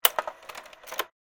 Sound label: Projector Slide Change; Silent Hill Downpour